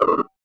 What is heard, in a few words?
Drums; Distored Reality